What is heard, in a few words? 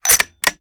reload empty load; reloads; gmod